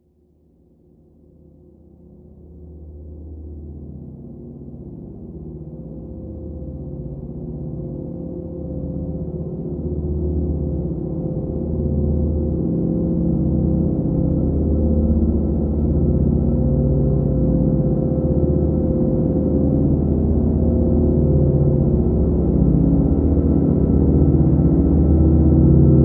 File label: Piano In Slow Motion